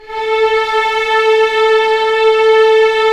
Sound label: STR, Vlns Orch; Roland L-CD702